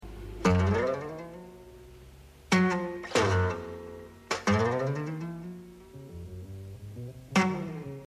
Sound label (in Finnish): kitara